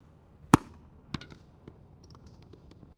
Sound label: golf ball hits hard surface and rolls; sports